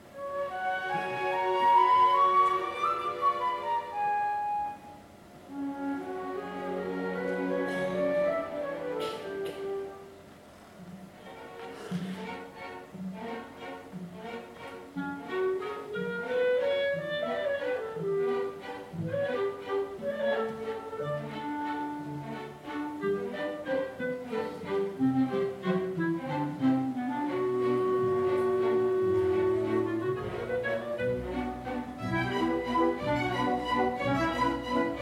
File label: Carmen concert; Fougeres